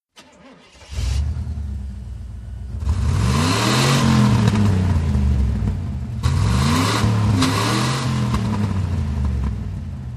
90 Camaro On Hard Revs, chevy camaro 1990 ext.

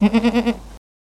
Goat Baah Neigh Close; goats

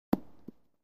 Golf Ball Bounces

